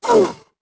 hit; The Elder Scrolls III Morrowind GOTY; XBOX

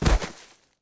bodyfall grass, foley

